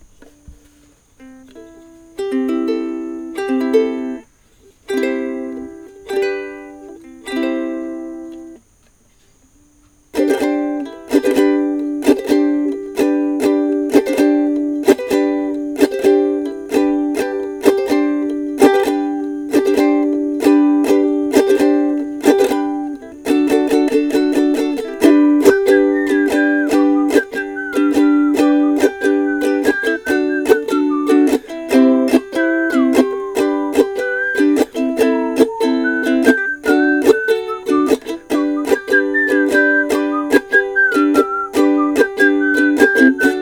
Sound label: test overdrive